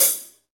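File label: hi-hat, hi-hat real; Sound & Vision - Gigapack I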